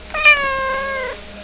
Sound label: meow